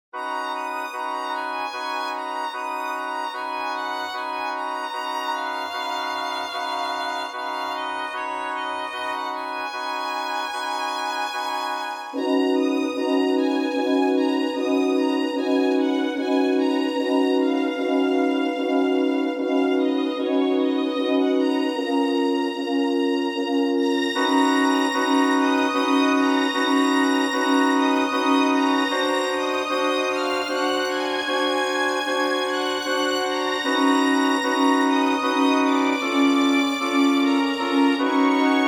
orchestra; holst; venus

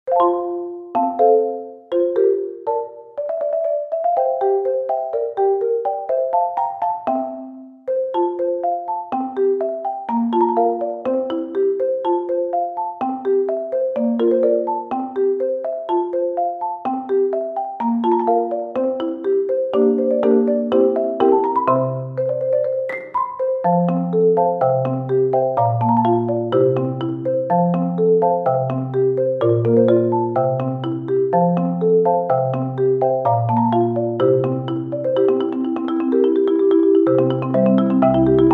marimba

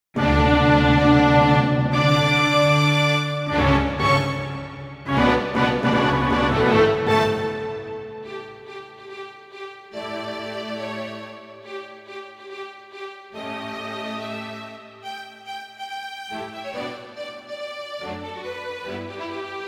symphony orchestra; mozart; symphony35 1

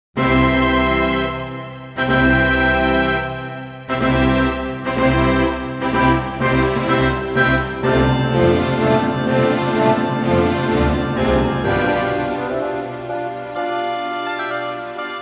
pianoconcerto25 1; classic; mozart

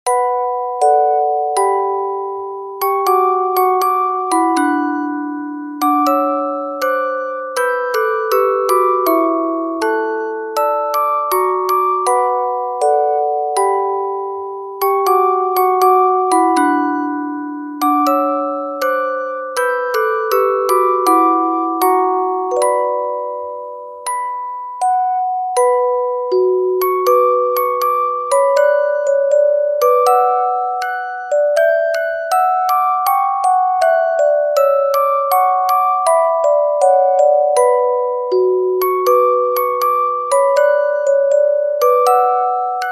farandole; bizet

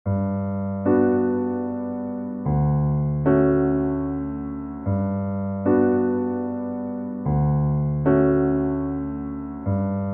piano; satie; gymnopedie

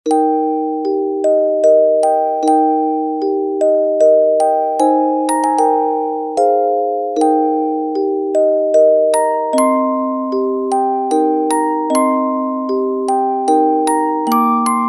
waltz; brahms

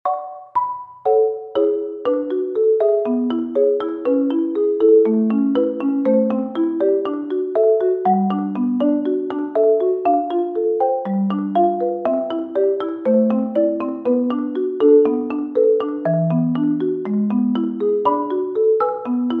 marimba

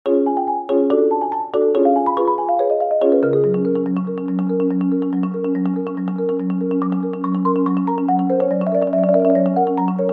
marimba

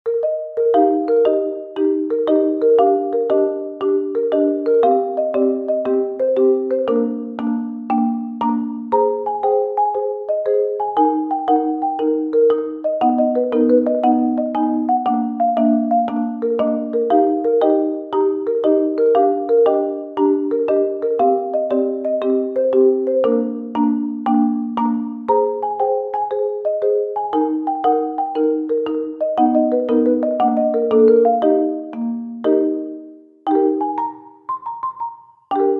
marimba

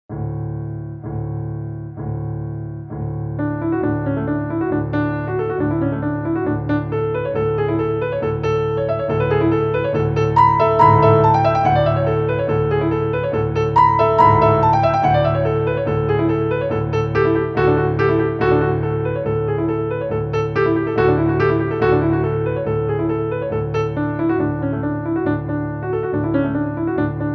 piano; grieg

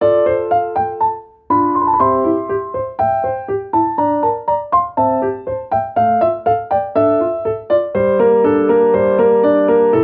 piano

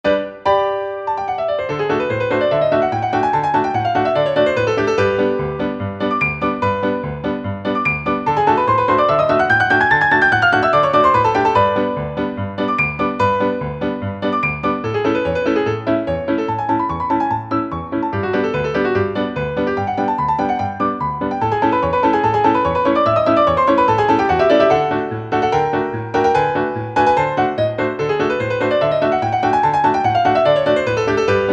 piano; 疾走 楽しい

